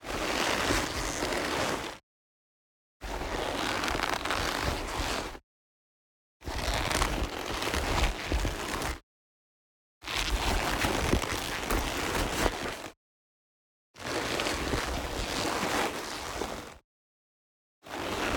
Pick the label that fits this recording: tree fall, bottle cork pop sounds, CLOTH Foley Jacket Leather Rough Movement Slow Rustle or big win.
CLOTH Foley Jacket Leather Rough Movement Slow Rustle